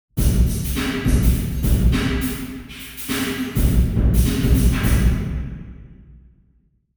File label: drum, sound sculpture model; tvisongur; iceland; source1 receiver4